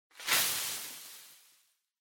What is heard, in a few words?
Throw Streamers Throw Single